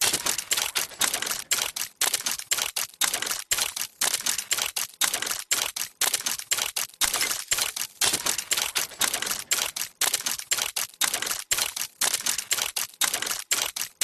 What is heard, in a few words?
Steampunk Mechanical Loop 120BPM; PMSFX